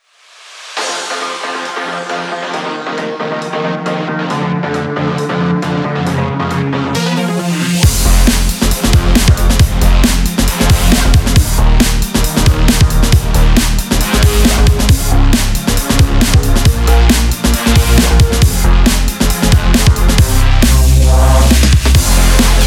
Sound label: Instrumental